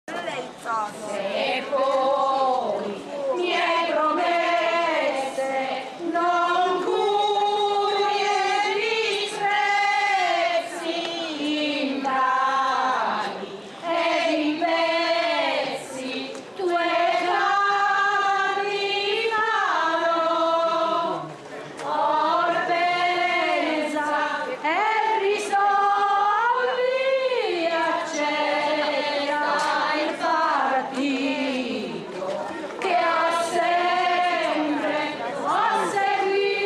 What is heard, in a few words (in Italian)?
Inno a S. Primiano Martire Lesina